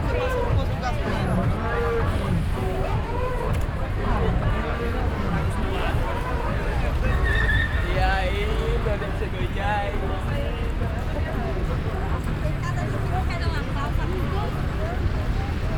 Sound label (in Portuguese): viadutto do cha, Brasilien; Fieldrecordings